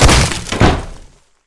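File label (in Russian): fall of entrance door with crash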